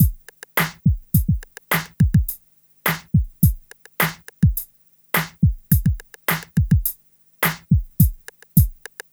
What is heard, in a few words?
Club Tip, R&B Drums